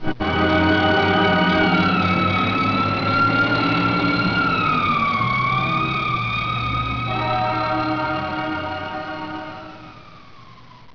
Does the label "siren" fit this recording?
Yes